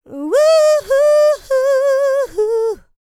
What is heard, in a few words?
LICKS, Vocal Planet, R&B; ILIO; Dance; LT R+B